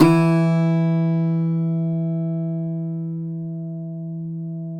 cuatro; Spectrasonics; Hans Zimmer Guitars VOL-2

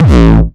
909 Grime Layer 2 - A1, Drums, Kicks; Mbase 11

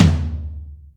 Room, Acoustic Tom; Yamaha DTXpress

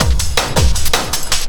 Drum & Bass, 160 BPM

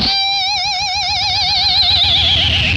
SKID, Funk Guitar; 90 s Sample CDs; Zero G

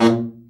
BRA Bari, SAX; Best Service Gigapack 1; BR SAX 2

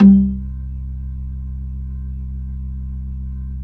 B-3, Jazz

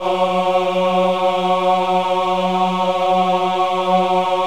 VIB F#3, VIBRATO, Voices; Spectrasonics

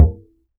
Upright Acoustic Bass, E STRING; Larry Seyer

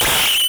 HI SCREAM, Anime Sound FX; COMIC

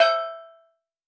SteelDrums E5; Calypso Steel Drum; Percussion

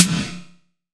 Snare, Gated Reverb; musicradar

